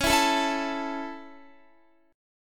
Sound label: Db Minor Sharp 5th Db down Guitar; Dbm#5; strummed; tuning=4E,4A,5D,5G,5C,6F